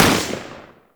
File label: Deth Cube Fire 3D, weapons; Warframe; Sentinel; Deth Machine Rifle